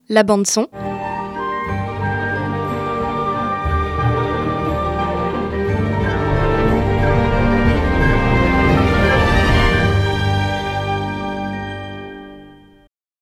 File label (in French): jingle; La Bande Son; StudioCanal